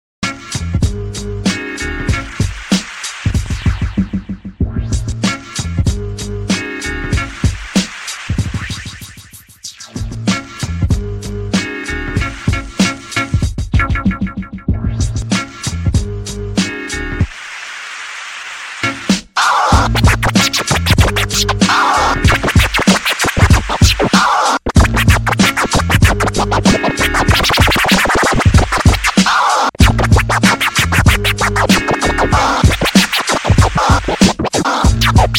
instrumental scratch remix

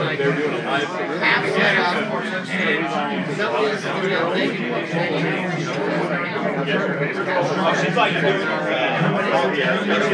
crowd

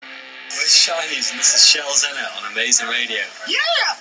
ident

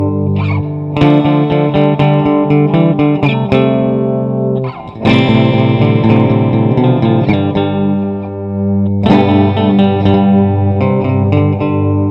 messy loop

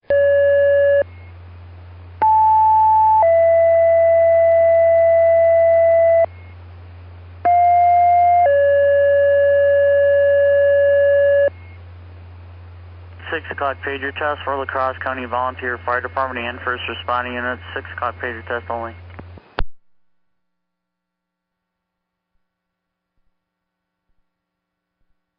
page received

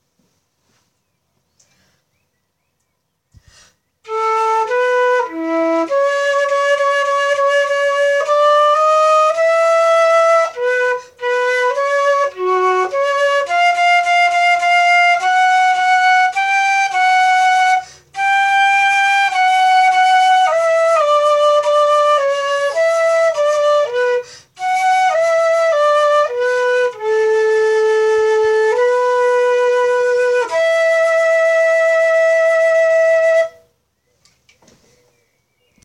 melody to a fugue in a major

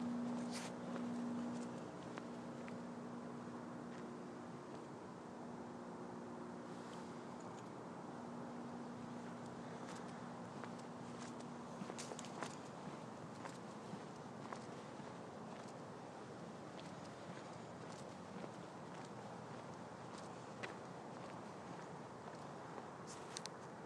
early morning ambience

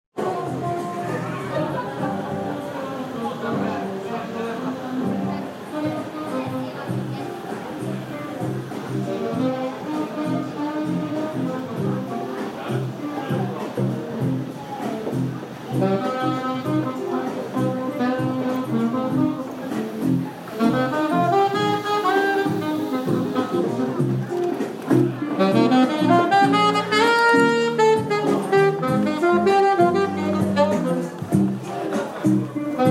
jazz on the piccadilly line

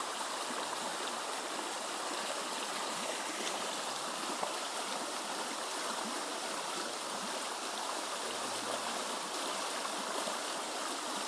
running water at dovedale

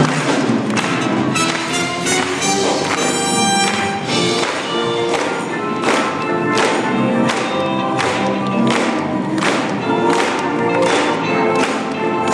radically inclusive singing at glide church

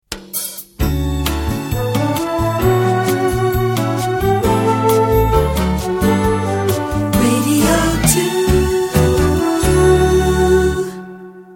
jingle; throwbackthursday

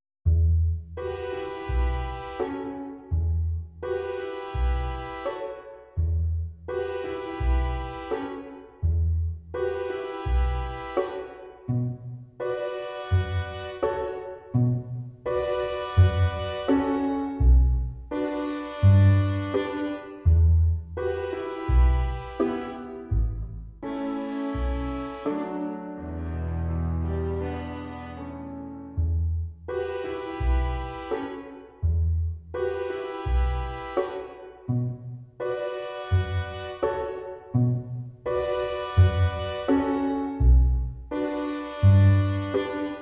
spring song backing track

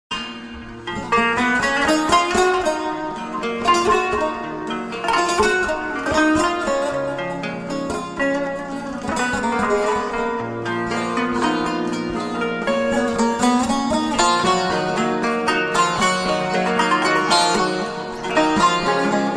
ringtone

